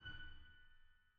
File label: harm F#6, Grand Piano; bitKlavier Grand; Piano Bar